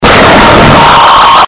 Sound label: pan bonk